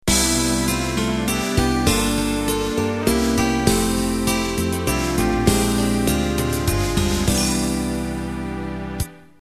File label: Waltz End; Band-in-a-Box